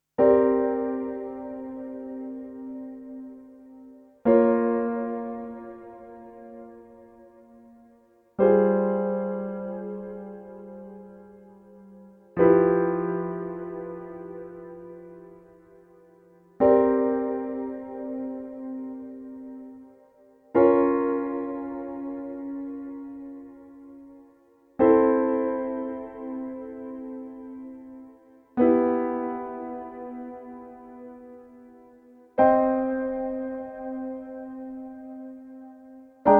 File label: Piano